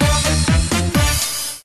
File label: free spin start; Amatic; Diamond Cats